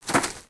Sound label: Footstep; Lego; Strombie; creatures organic